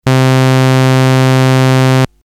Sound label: Saw; Perfourmer Mk2; 42Khz